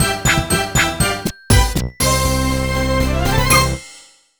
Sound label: Jingle WIN BPM100; super scribblenauts; nds